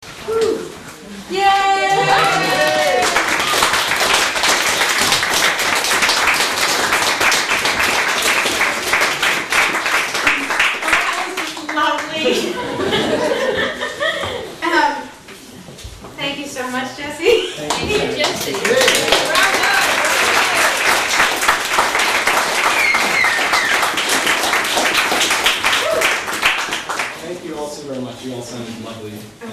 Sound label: applause and thank-yous